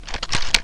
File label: sniper pickup